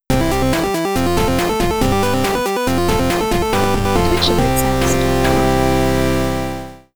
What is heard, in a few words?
8-Bit subscriber